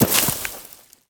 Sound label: Bodyfall Weeds; SFX Kit; Bodyfalls